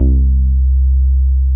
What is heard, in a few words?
JX round C3, Drums; Drums In Your FACE!